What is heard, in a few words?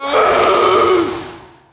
iceq hit; DAoC